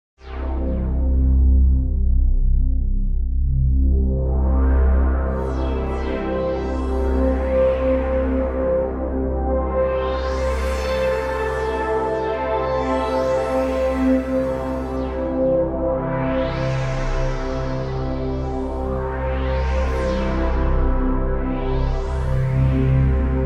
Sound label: jet streaming, Roland JU-06 DigiD drone; SoundDiviner Project; Roland JU-06 Pack08